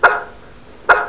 Blaireau Aboiement

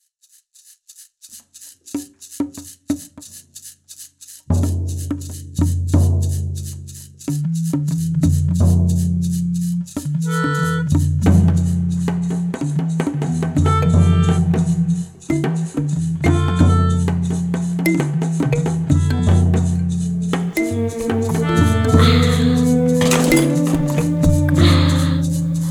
claque langue

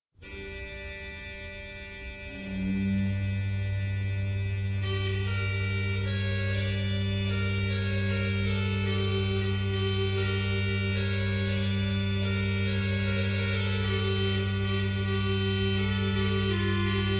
Bourdon

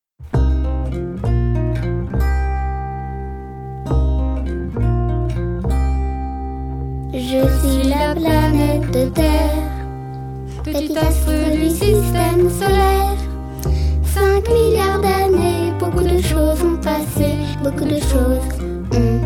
Chorale

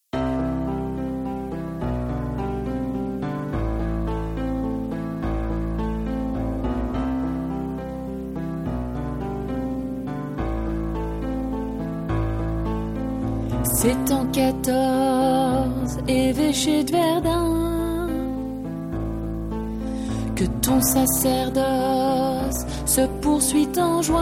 Chorale

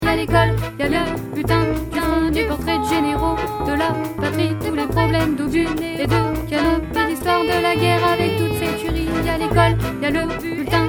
2v

